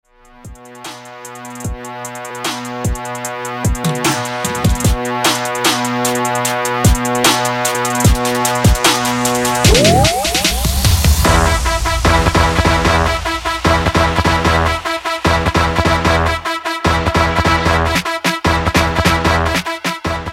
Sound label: Trap Bootleg